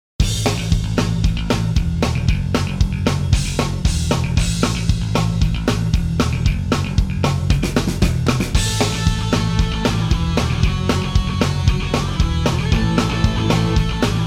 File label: Pop Punk Instr